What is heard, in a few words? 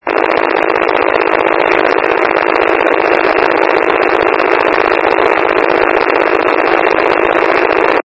wcalert, County Wide Paging; County Wide Paging Archive